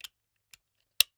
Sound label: Industry Belt Tool Belt Latch Snapping